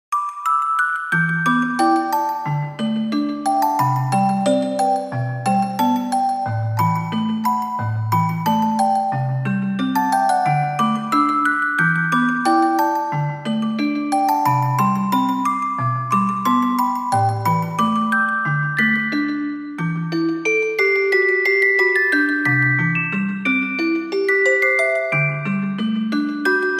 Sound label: toy clock